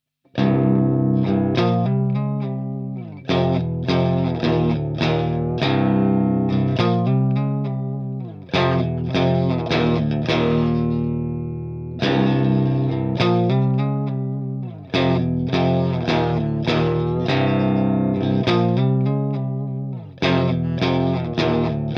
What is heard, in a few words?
GTR BYPASS